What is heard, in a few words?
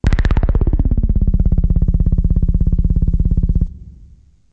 ultra low; Basses